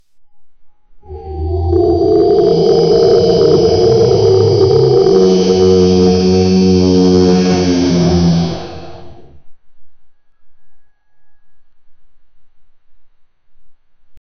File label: distorted roar from pain